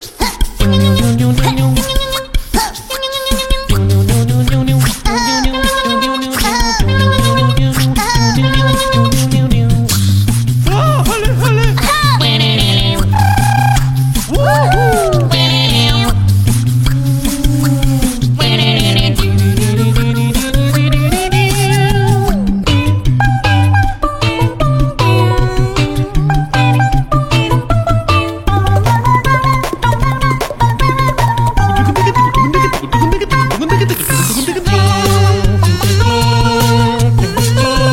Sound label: Acapella; Talking Tom Gold Run